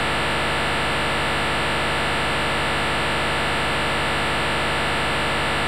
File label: 173.800 mhz